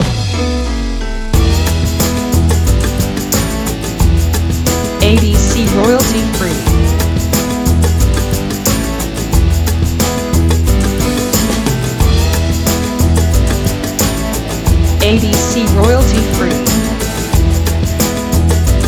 Lazy Crime TV Show Theme

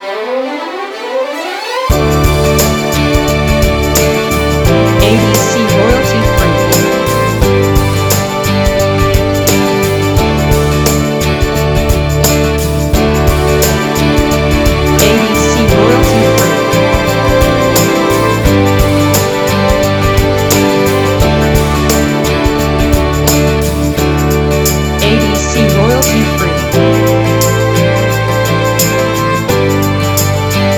Downtempo Chillout Strings

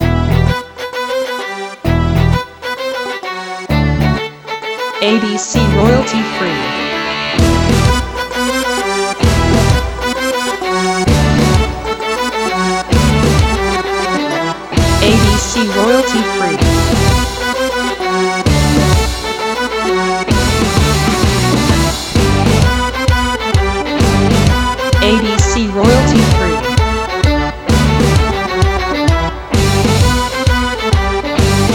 Irish Rock

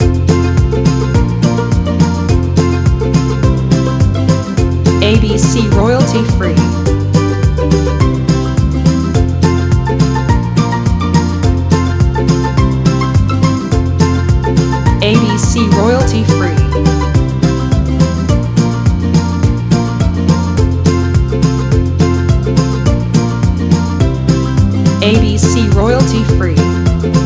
Happy Cajun Dance

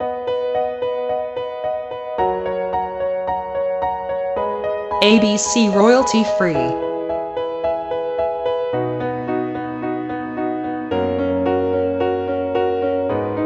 Piano Epic

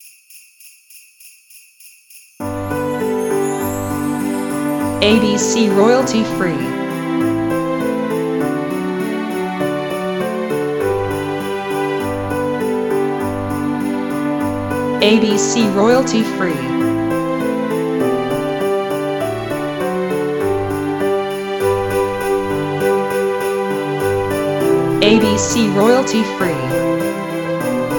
Jingle Bells Piano sleigh

